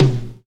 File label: Toms, Real